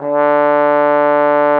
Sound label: D3, TROMBONE; Xsample VOL-3